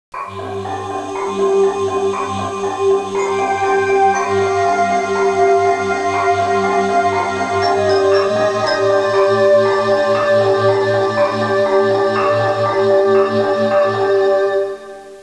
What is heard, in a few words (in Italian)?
musica newage